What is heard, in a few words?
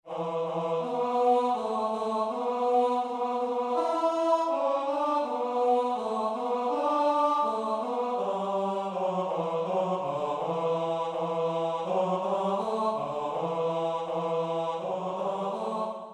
tenor